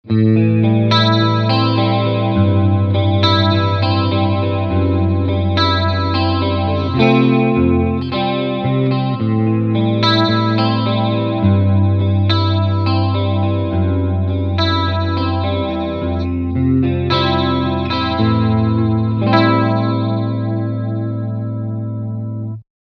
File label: Chorus